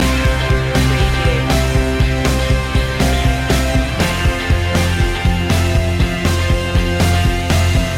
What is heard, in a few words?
anthemic rise loop